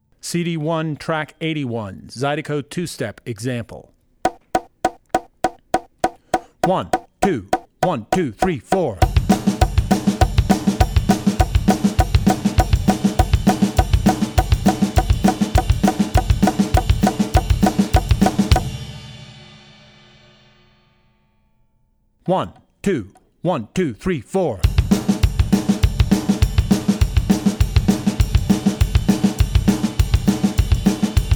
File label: Zydeco Two Step; Drummer's Bible Tracks